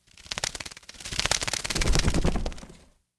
Bird Fly By; moul

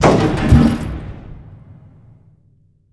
Pressure Plate Up

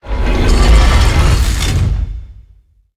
Well Door Open Close

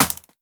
Bskt Stn Hit; moul-assets

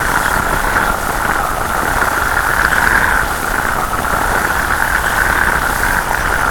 Fumerol Loop; moul

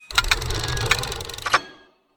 Ring Puzzle

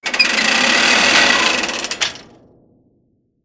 Harvester Ladder Up

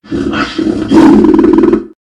Creature Button; moul; payi

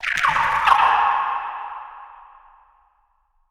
Bahro Timid

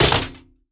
Maint On Stone Jump

